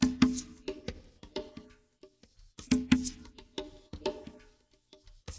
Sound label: LQPERC; Spectrasonics; Liquid Grooves 1 & 2